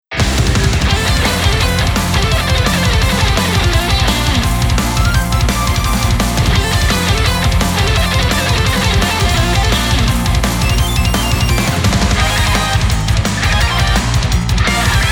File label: Metal Rock